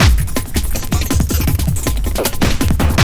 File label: JUNGL 160, JUNGLE LOOP